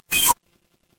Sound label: ui power off